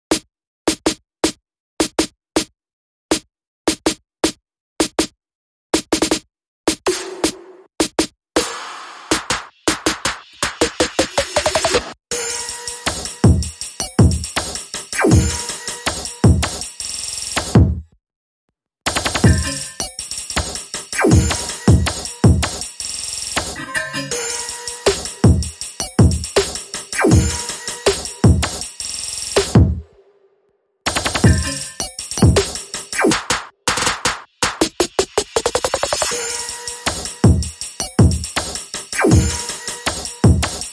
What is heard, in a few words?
Simple Drums Only